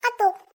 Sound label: ringtone